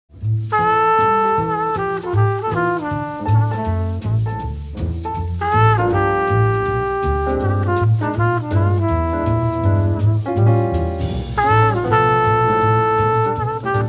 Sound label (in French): le jazz